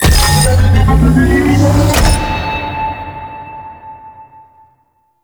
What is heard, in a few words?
Drone Hack Complete; Missions; Railjack; Override